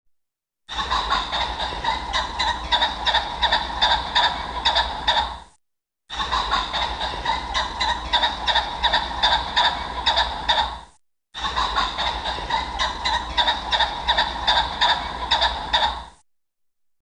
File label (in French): faisan colchide